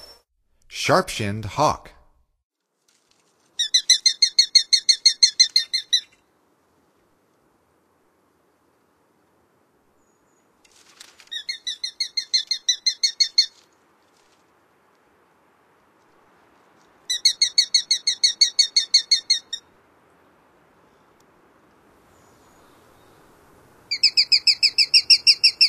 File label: Sharp shinned Hawk; North America; Stokes